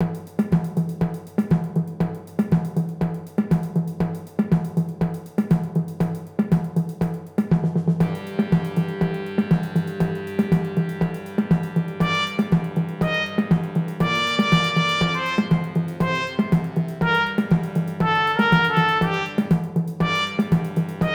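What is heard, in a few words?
samba, trumpet 2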